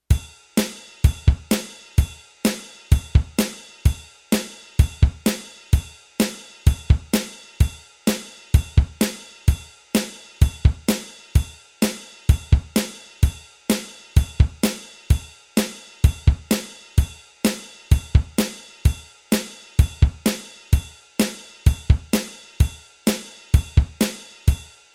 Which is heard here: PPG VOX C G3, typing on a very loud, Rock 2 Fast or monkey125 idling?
Rock 2 Fast